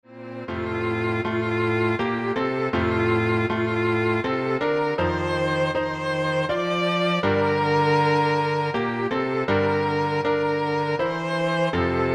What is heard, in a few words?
Piano Trio